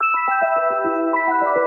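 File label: Mysterious Arp, E minor 107 bpm; MIDI Clips; Tonal; Motifs; Ableton; Factory Packs; Mood Reel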